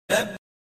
labbaik allahumma labbaik ringtone islamic ringtone